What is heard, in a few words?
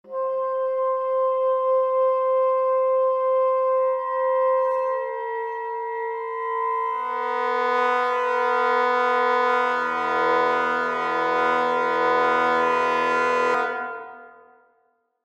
orchestration